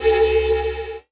Multimedia Internet CD-Rom Flash Buttons Electronic